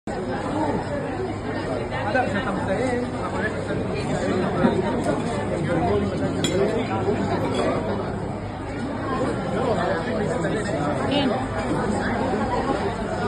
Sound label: crowd noise, crowd ambiance